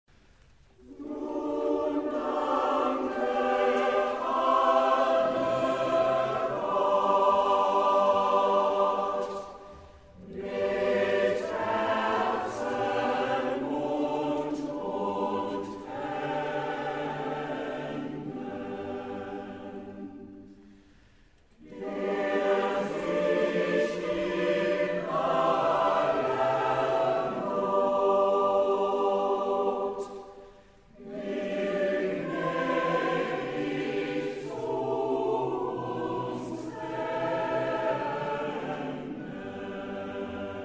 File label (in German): Choral; Mendelssohn Lobgesang